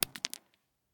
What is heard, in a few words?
Shell 39mm dirt; STALKER; IWP